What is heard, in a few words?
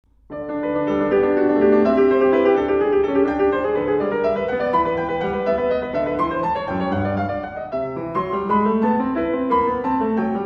piano sonata